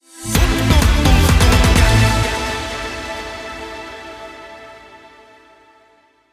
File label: Mus Sting Win Streak